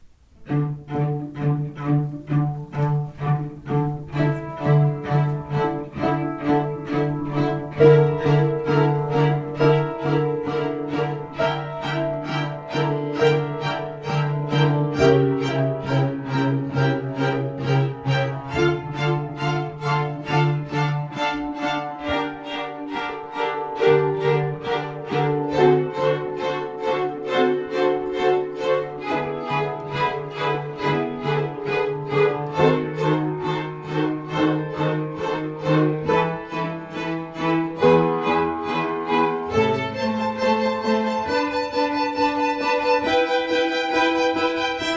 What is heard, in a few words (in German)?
streicher winter vivaldi